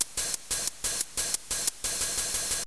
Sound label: rave hihat; lmms